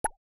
Tech Bubble Pop Notification Synth Electronic Particle Cute Cartoon